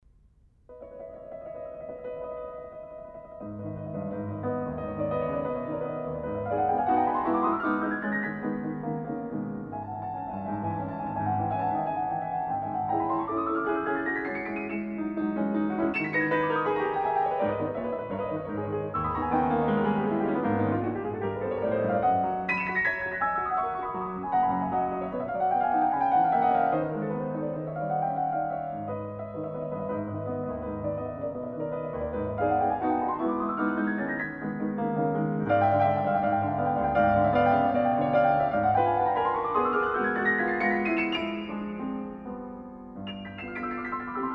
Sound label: Etude for piano in G sharp minor; Chopin; Etude for piano No. 18 in G sharp minor ('Thirds') Op. 25-6, B. 78-3